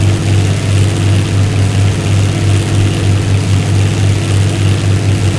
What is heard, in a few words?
maus engine rpm 99 load, tanks; War Thunder